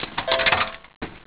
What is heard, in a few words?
drop, slot machine sounds